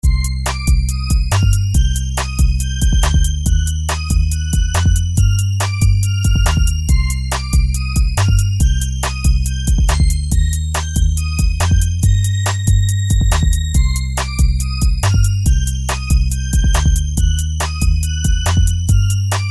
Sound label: charge beat